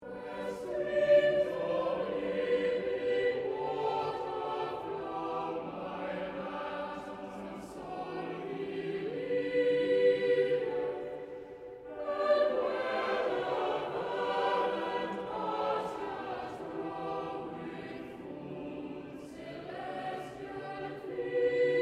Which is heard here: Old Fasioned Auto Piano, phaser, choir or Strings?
choir